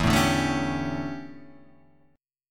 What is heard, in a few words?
D Major 7th Suspended 2nd Suspended 4th